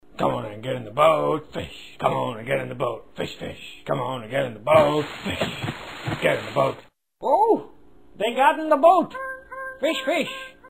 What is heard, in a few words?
fish jig